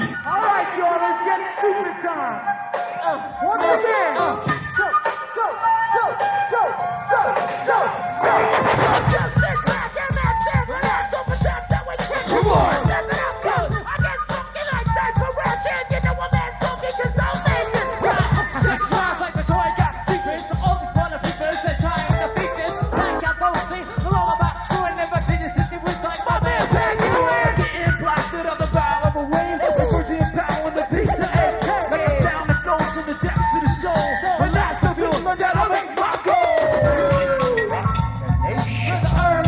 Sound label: flute loop; San Francisco CA